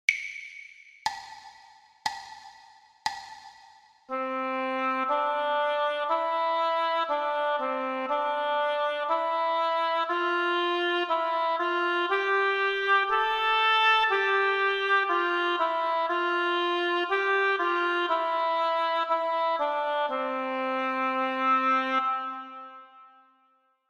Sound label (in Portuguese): percepcao ditado